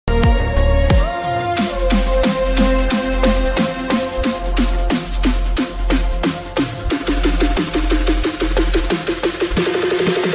attitude bgm; king of kotha; dulaqur salman

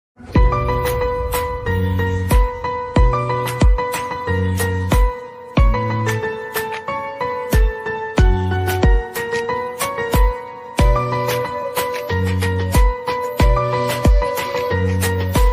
instrumental ringtone, hindi ringtone

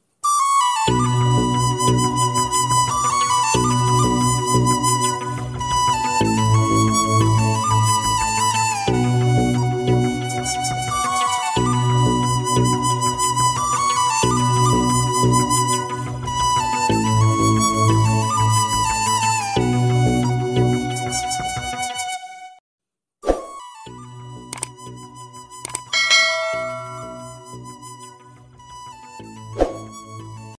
odia love romantic bgm ringtone; Bidyarana; sad ringtones

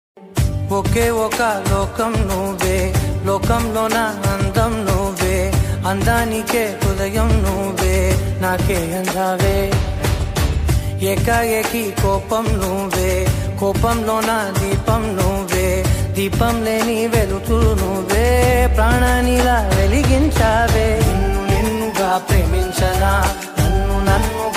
telugu ringtone song

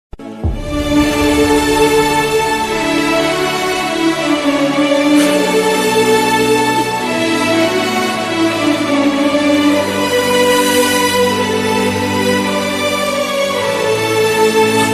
sad bgm ringtone only music; ms dhoni

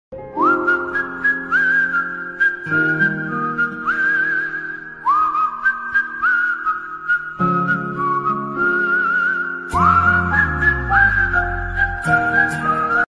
flute

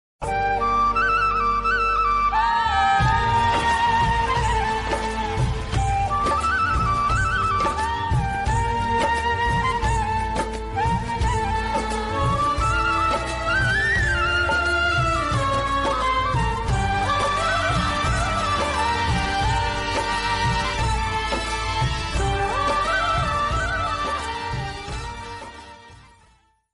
flute ringtone

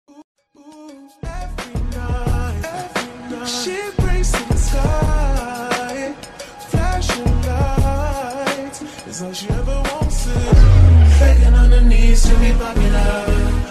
slowed reverb

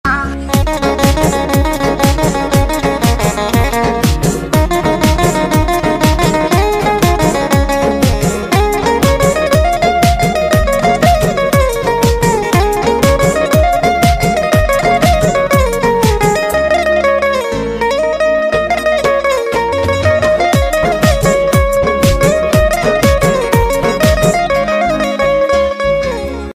banjo ringtone pahadi ringtone

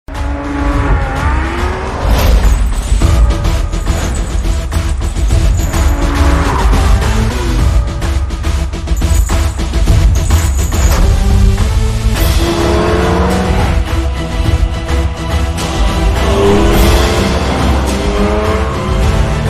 ringtone instrumental; salman khan entry in jay ho movi

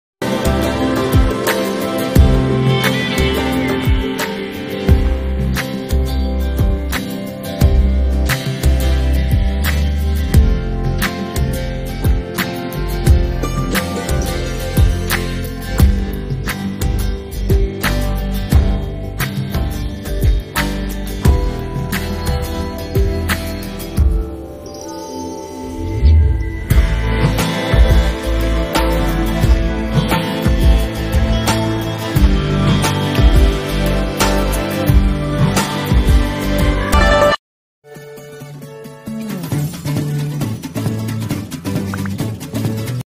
ringtone instrumental ringtone; shraddha kapoor

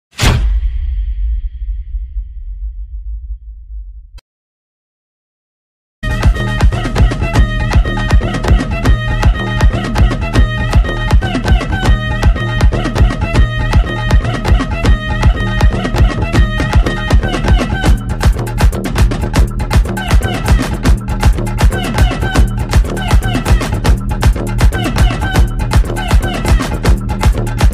climax fight bgm ringtone; maaveeran

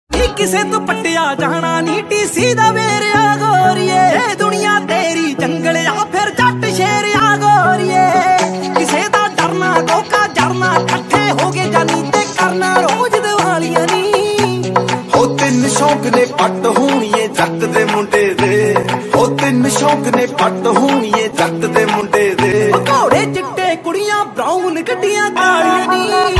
new punjabi song ringtone

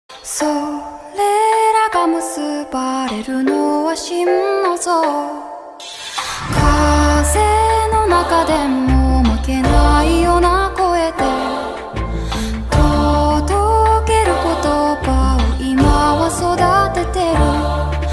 love ringtone

